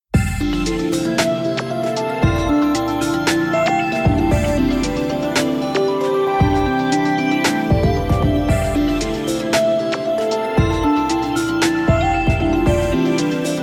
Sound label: start, music; sven; digilab